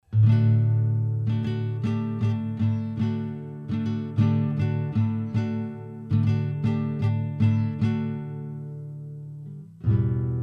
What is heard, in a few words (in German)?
the tbone rb 100 akustik gitarre